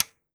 SWITCH ON; AKAI Anime Sound FX; SWITCHES

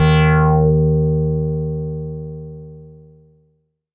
Rezzer d#3, Korg Poly-800

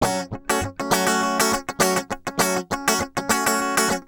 100 BPM

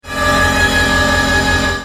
Siren, Ambience; Industrial

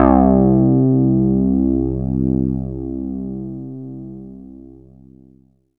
ANA BS, SYNTH BASS

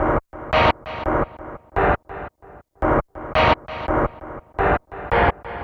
Synth Dirtblow; Sonic Foundry (Sony Creative Software); Knutrix Drum & Bass

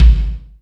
BIG KICK, ACOUST KICKS; Greytsounds

